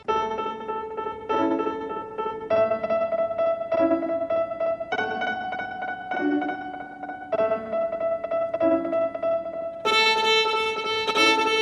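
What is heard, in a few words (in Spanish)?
Twinkle Ritmo E Normal Muy Lento, violin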